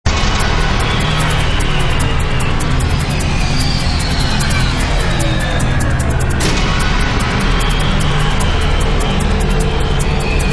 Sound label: wateraltar - suspense; Heavy Metal F.A.K.K. 2